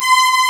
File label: STRG F5; East West; Global Sounds